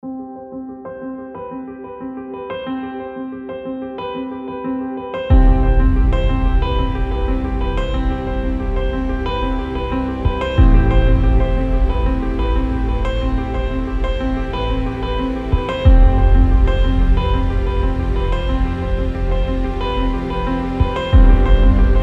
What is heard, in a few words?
Background Audio